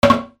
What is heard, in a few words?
BATHROOM